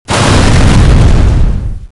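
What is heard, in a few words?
mine explosion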